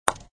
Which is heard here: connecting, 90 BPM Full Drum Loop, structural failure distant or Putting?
Putting